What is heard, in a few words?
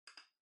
OS Sound Speaker Mouse